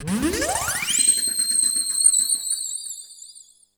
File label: ATMOS; East West; Global Sounds